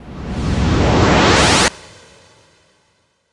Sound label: NOISE, Production Effects